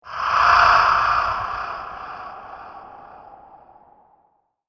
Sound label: Ghastly Presence, ghost sound effect; horror